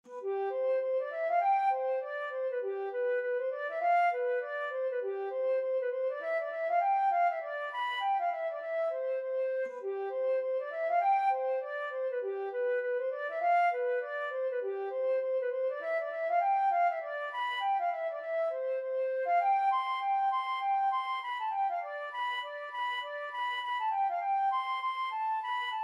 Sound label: johnny cope, flute